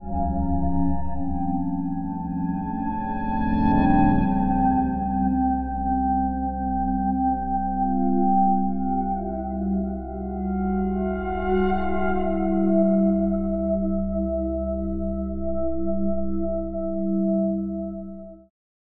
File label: PAD Atonal; SpheratorFM; Dawn; syntheway